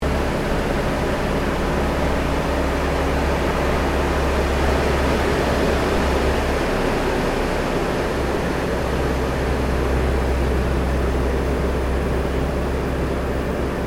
AIRCRAFT CAC CA-25 WINJEEL FLYING INTERIOR, fighter trainer; RAAF Winjeel fighter trainer; Airplanes; Vehicles